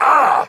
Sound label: Pain, Barks; Kuva Lich; Persona A